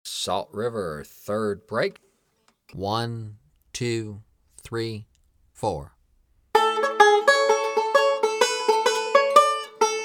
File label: Break @ 65 Bpm